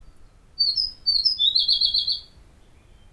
warbler white-spectacled Seicercus affinis intermedius